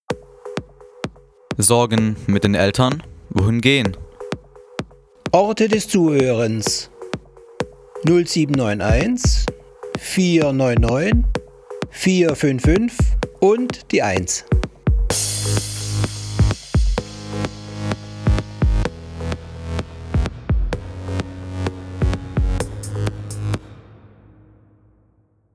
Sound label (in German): jingles elektro beat; by daniil